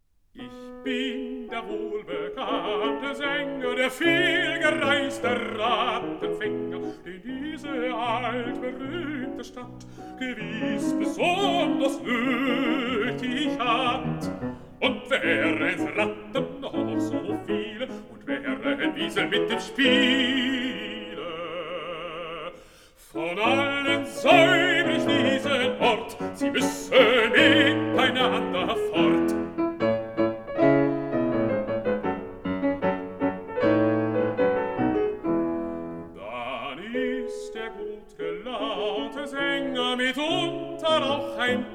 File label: song for voice & piano